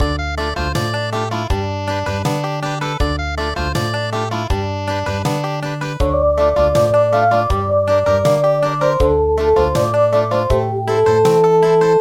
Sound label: Country; Cay True Xinh